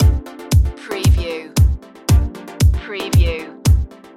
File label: house chilling loop